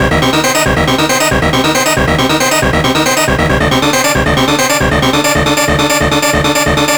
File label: Rave Stab